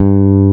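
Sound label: MAGIC BASS G3; MAGIC BS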